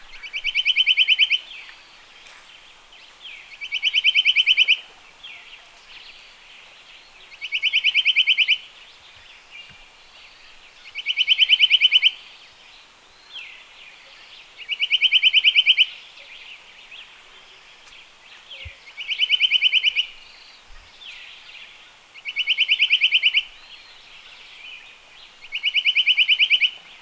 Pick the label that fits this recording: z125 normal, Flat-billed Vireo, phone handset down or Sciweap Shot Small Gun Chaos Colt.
Flat-billed Vireo